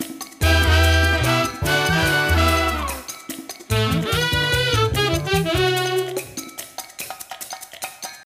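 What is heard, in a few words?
food world music paradise suspens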